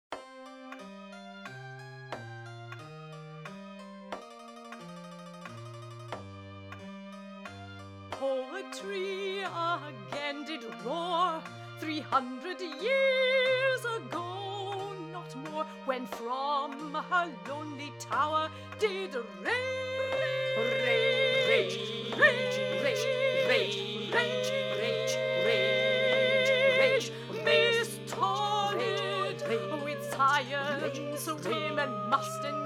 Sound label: Song VERSE ALL VOICES click